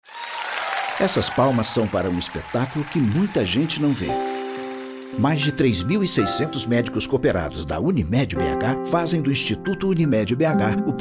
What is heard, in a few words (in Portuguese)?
Aplausos; Instituto Unimed